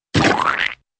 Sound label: ker splut